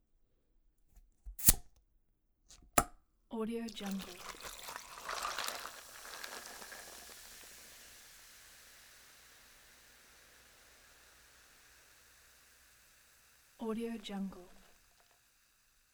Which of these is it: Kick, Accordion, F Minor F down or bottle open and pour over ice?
bottle open and pour over ice